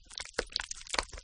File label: step octopus